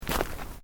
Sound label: Footsteps Boots Road Gravel Step; Footstep Foley; Footsteps (Sound Effects:Human)